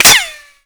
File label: impact metal; Modern Warfare